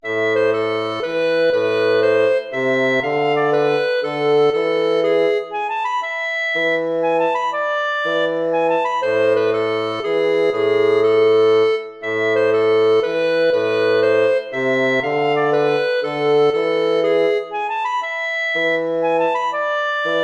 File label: baroque minuet minor